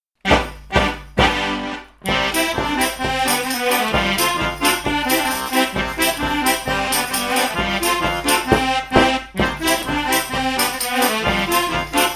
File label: Polka; Wadolowskiego